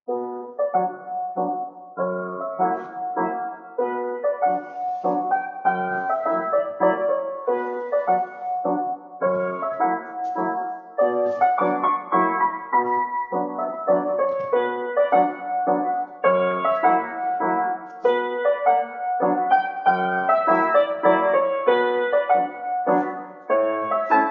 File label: Waltz